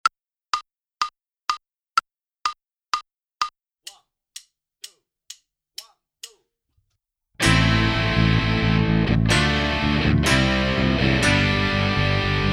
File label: Minus Drums; Inst Ensemble